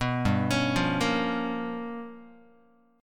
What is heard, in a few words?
G 7th Sharp 9th Flat 5th, Guitar